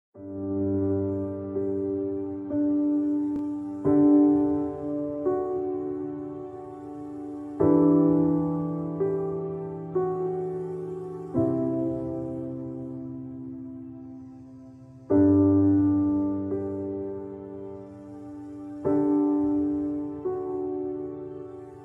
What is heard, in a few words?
Piano Relaxation; hotusa spa